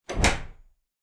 Door open

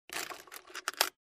Coins in Coin Box

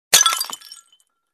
Ceramic mug breaking

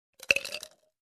Ice Cubes put in drinking glass

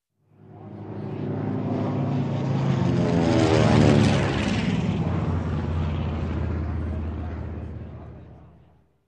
Propeller Aircraft fly over

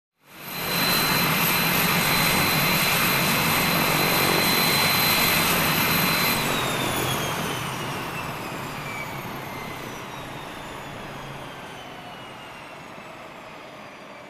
Aircraft Propeller Toned Down Near

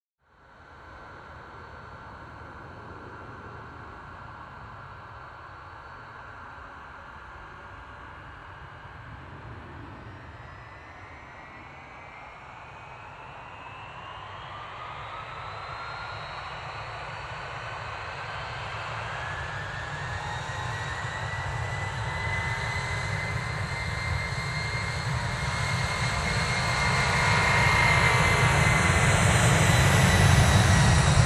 Aircraft Ground Rolling and Taking Off

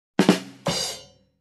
Drums after joke variant

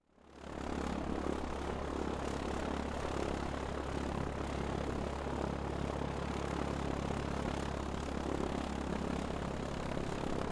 Biplane Ground Rolling

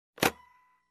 Suitcase edge locks

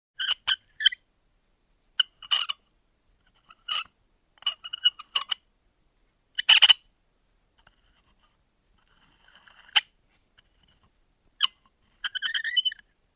Small metal wheel squeak

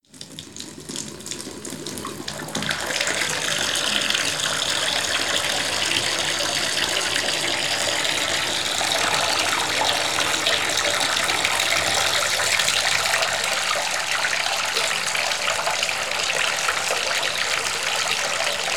Toilet Urinating Long

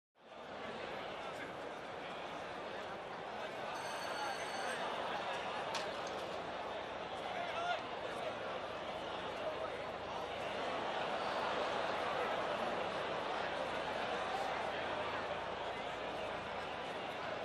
Crowd Distant

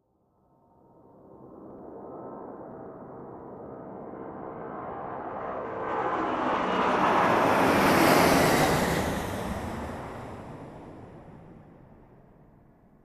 Commercial Plane Lifting Off

